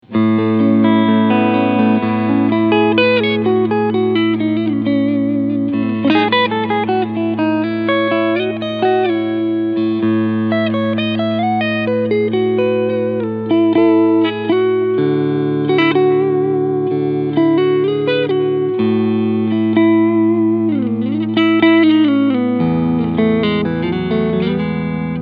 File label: Tribute S-500 Cleansound Front Singlecoil